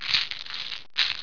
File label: shuffle